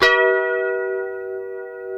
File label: F 4C funk chords, guitars; Spectrasonics; Hans Zimmer Guitars VOL-1